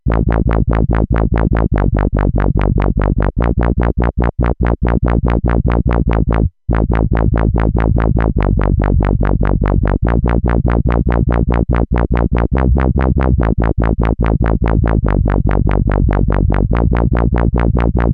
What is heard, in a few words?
super synth speed of downsweep, bass; markbass